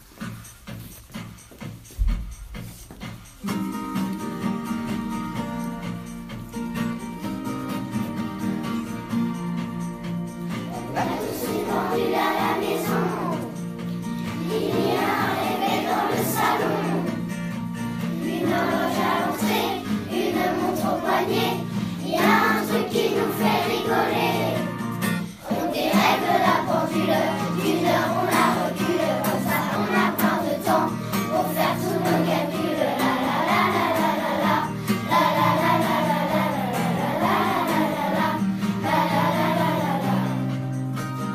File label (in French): Musique La Pendule